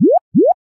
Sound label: Touch poyo poyo